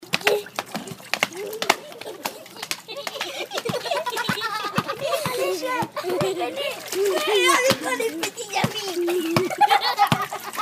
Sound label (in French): terrain de jeu